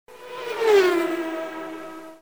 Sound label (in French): Formule 1